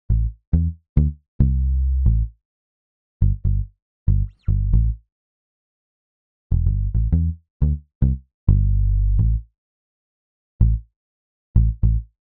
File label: Island Reggae Bass